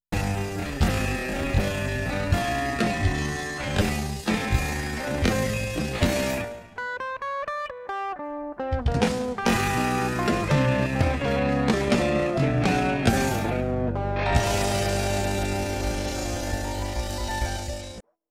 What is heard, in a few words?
Outtro lick